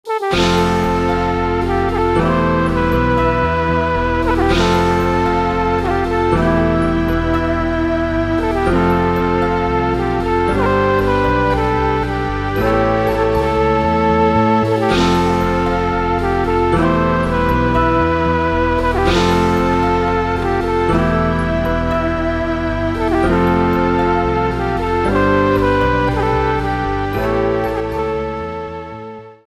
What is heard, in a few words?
Victory ver.; Dynasty Warriors; ps1; Xiahou Dun